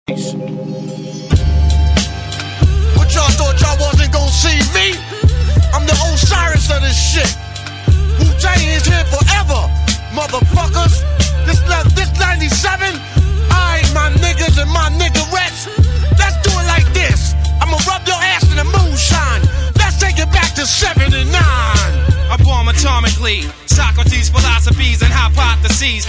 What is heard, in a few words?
hiphop